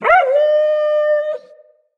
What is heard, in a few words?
Wolf Cub Trapped Call Far; Animals; Wolf Cubs; Orengal Wolf Cub C